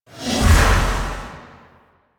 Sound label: Temporal Drag Cast; Warframe; Focus; Zenurik